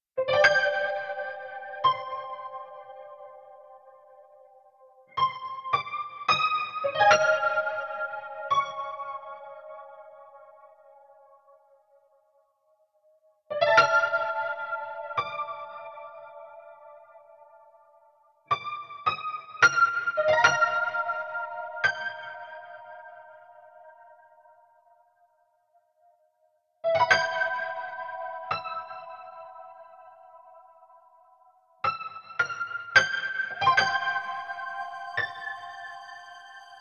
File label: Shelter Dialog Bittersweet